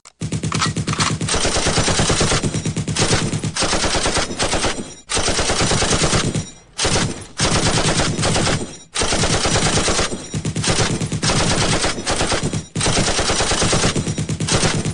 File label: gun shooting loud